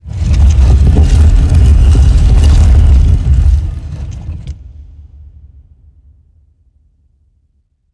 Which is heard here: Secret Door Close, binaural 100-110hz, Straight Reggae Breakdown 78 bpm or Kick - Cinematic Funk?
Secret Door Close